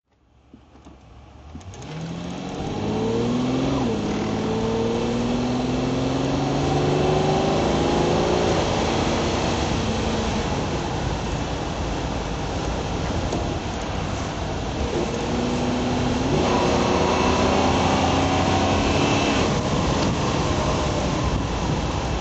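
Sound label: jaguar etype v12 conv drive, Engine sound driving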